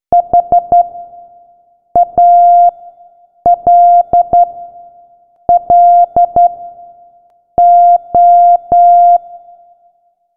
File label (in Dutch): morsecode